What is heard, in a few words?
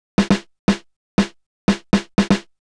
626 Snare; hydrogen